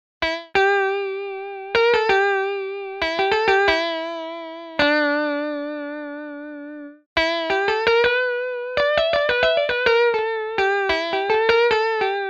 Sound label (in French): guitare lead; eastwest colossus